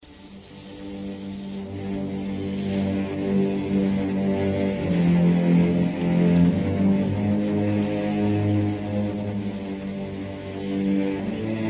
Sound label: Violin Concerto in D, Quasi andante; Busoni; Op. 35a